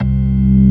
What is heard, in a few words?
ORG. C2; B3 FULL